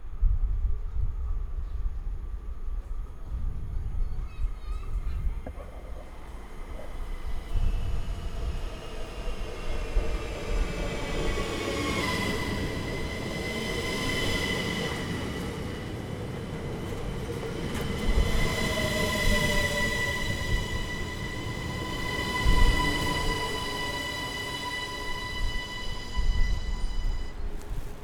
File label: Belgian Train Another Stop